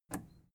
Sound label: plastic button scratch release enable